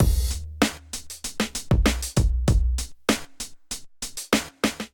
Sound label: Kit groove 97 bpm, Drums; MIDI Clips; Move Up; Ableton; Factory Packs; Chop and Swing